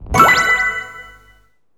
SE RESULT CP UP; maimai

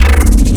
custom bass

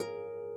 harp

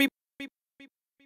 voice

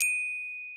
gloken

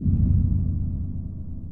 heart

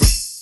Bell; 偶像大师

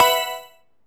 GAME HOLD LOOP; maimai

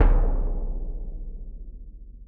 orch bassdrum

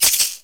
SE GAME BREAK; maimai

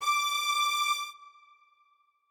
strings

